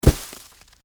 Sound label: Snowball Impact, Weather, Snowball, Impact, Big; BlastWave